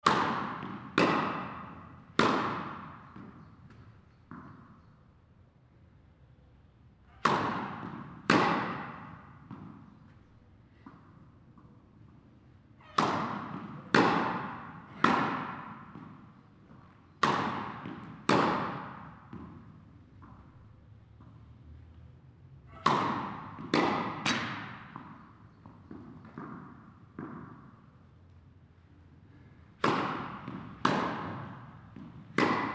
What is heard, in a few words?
indoor tennis hitting ball